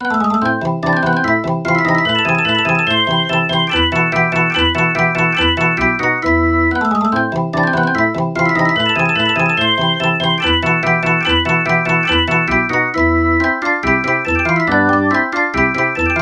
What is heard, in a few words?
percussive organ mozart samples